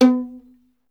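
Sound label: STR violin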